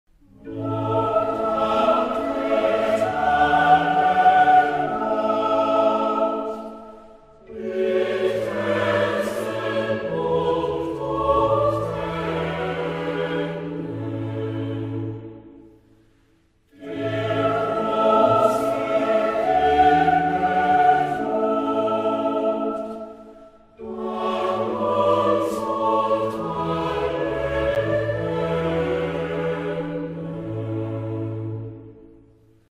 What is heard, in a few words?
A major chorale; Bach